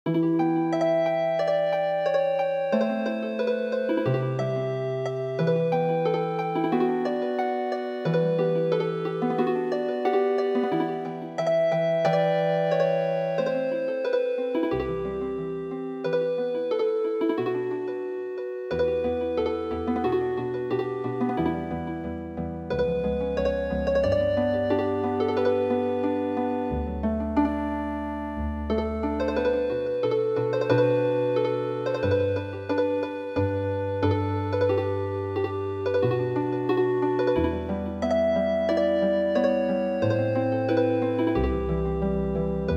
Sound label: Healing Harp; midi; traditional